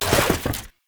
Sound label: Arcade Dash; Volt; Warframe; Ambience; Nineteen Ninety Nine